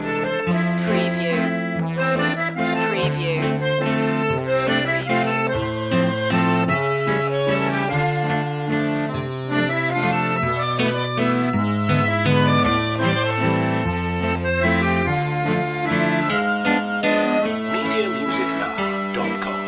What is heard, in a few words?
cafe musette loop